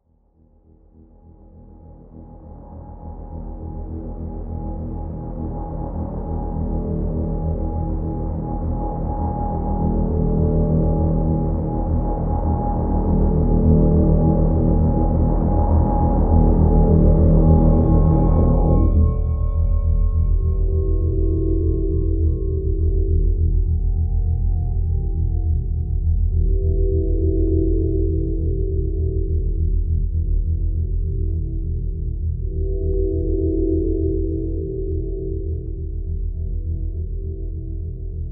Forest Drone

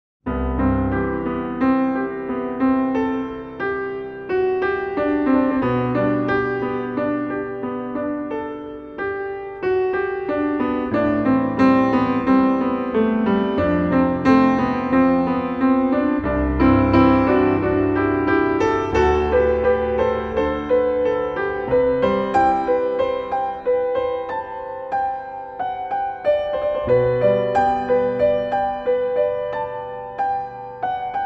Piano Solo

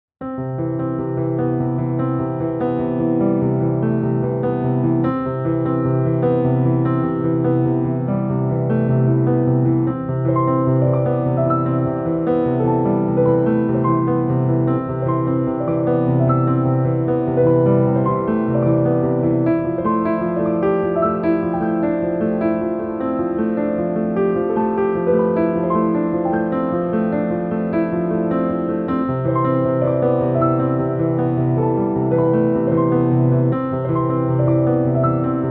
Piano Sketch

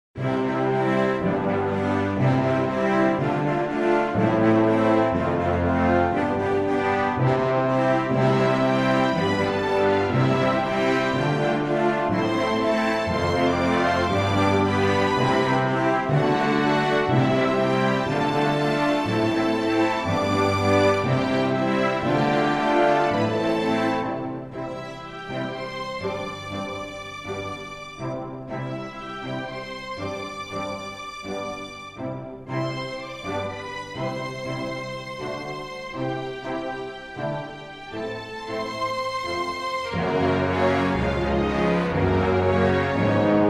Instrumental

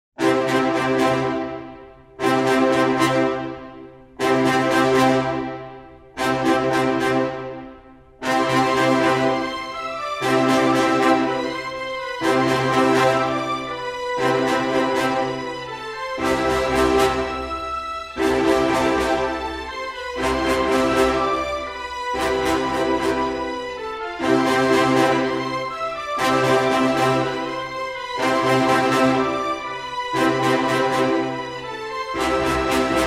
Instrumental

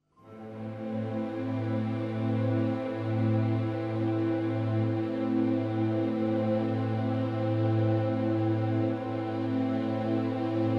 Strings Only

